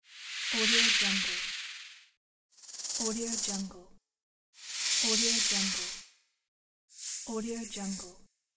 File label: shake hiss; warpjumper